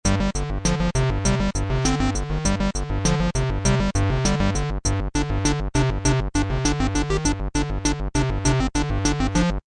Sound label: c64 music loop; crazy chicken